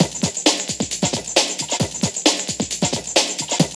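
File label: drum loop; X-Static Goldmine 3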